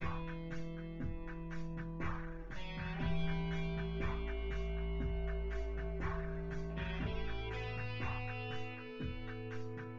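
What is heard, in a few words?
with drums synth conv 5cm sim; SASSEC07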